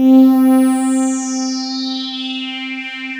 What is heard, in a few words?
big blue, Korg O1/W; Rubber Chicken Software Sample Bank